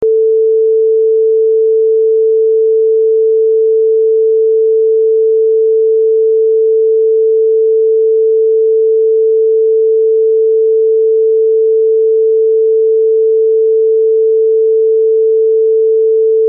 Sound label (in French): 443Hz droit